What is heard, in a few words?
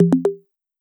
voice connect